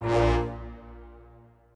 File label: strings